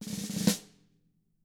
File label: roll, snare rolls; ILIO; Double Platinum Drums 1